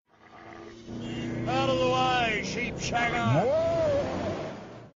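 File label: New Zealand police vehicles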